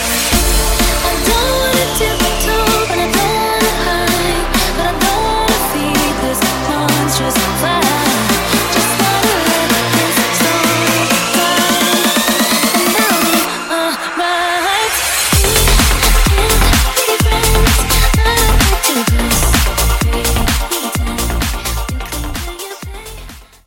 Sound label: 128bpm Dm